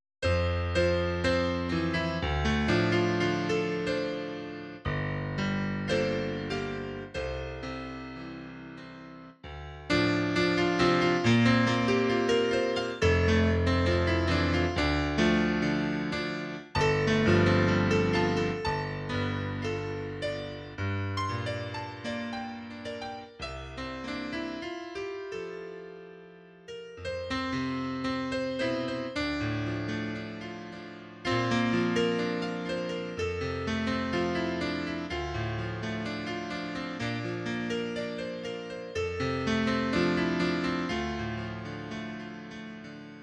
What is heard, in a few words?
Forest, piano